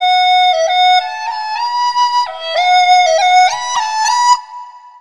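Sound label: CH FLUTE, Chinese flute; Soundscan vol.60; Asian Traditions; AKAI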